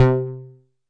mbrute syn, Arturia MiniBrute; DRUM MACHINES

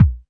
Pearl SC-40 Kicks

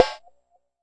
Jomox MBrane, drum machines; Jomox MBrane 11

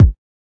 kik

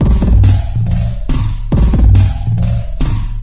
140 bpm, stereo industrial, drum loops and breaks

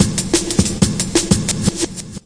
DRUM LOOPS and BREAKS, 183 bpm

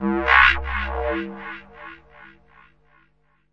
dnb dig eko; Basses

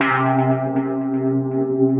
Synthesizer Middle C Notes, Lead

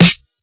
classic hh Sn; STYLE KITS (Hip Hop, Etc); Classic Hip Hop Mega Kit

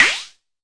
mbrute sn, Arturia MiniBrute; DRUM MACHINES

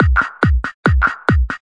140 techno filterclicker

